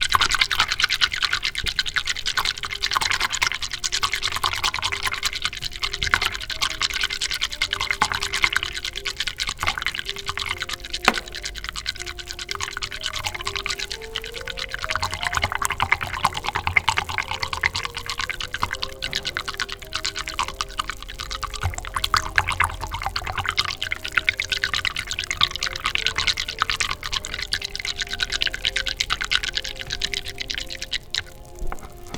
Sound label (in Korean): 물소리(붓)